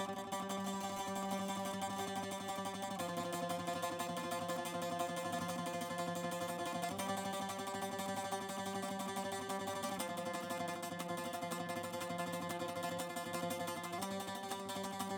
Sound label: saz